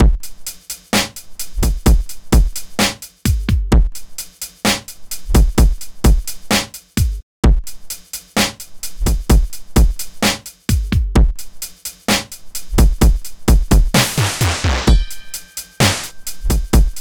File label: all drums; 129BPM; Pony Fan Music Remix Stems